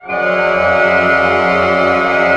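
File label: cluster pianissimo, Orchestra; Sound On Sound - Peter Siedleczek's Orchestra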